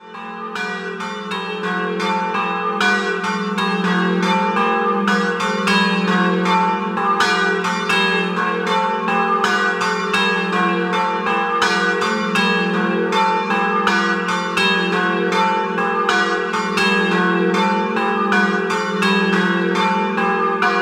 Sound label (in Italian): Colle di Arba, campane